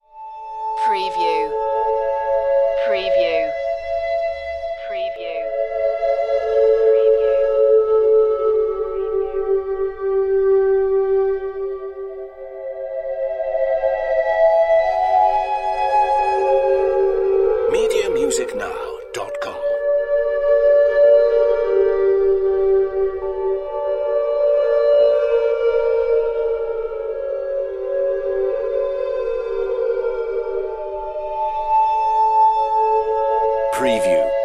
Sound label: alien talk